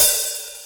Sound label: OPEN HI-HAT